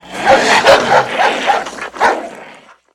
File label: wolf, monster dog boss; 12zi dog boss